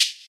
studio shaker hi hat g sharp key, shaker percussion tuned to the G# note